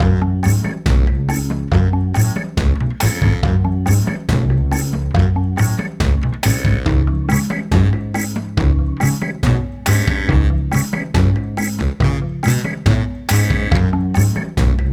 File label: Background Music; Quiplash 2; Jackbox Games; The Jackbox Party Pack 3